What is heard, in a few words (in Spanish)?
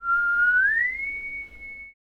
Voz tenor mf